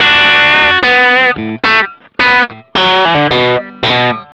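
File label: blue riff 110, Vintage Blues Guitar; USB Soundscan vol.22; AKAI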